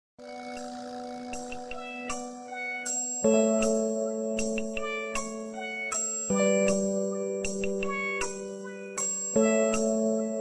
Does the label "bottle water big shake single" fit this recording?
No